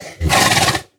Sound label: snort